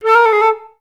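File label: flutes A3; Best Service; Peter Siedlaczek's Advanced Orchestra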